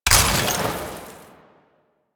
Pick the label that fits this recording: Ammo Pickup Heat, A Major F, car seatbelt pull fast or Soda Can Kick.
Ammo Pickup Heat